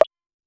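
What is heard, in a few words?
short soft ui button click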